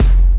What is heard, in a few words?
Moombah Kick; Moombah Kit; Hip Hop; STYLE KITS (Hip Hop, Etc)